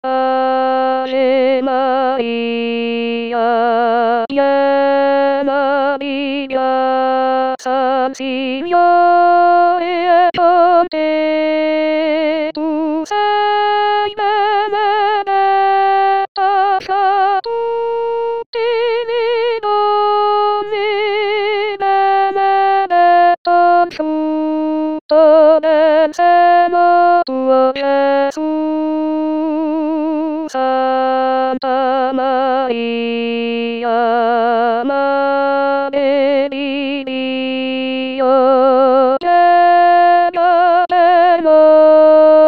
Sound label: Ave Maria Tenors